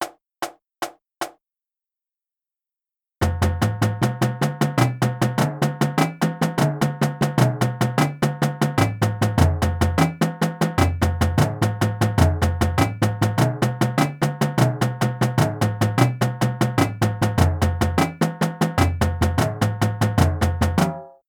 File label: Flams